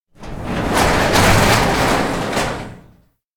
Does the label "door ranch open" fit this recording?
Yes